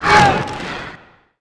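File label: Ghost Knight Damage; Seer; GamigoNA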